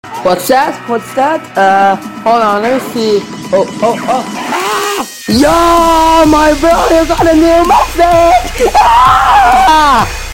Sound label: stupidest notification sound